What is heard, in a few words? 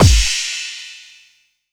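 bass drum FX, dance drums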